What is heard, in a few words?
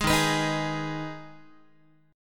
D sharp Suspended 4th Sharp 5th, Guitar; strummed; JGuitar